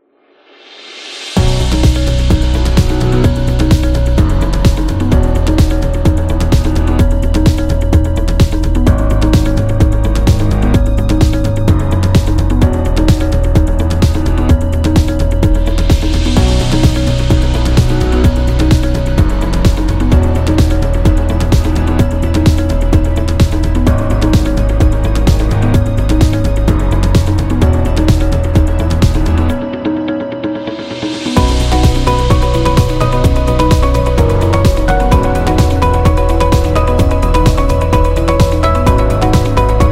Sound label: For Corporate